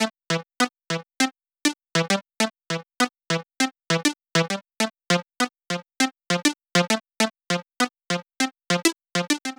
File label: Latin HipHop Synth A min; Digidesign; Pro Tools Essential Loops